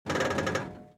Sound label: Alchemist Creak